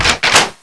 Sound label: shotgun pump; XDM